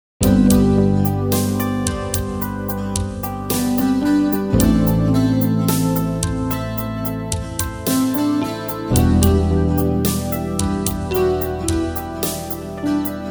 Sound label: guitar